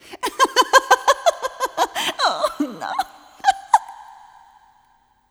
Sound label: laugh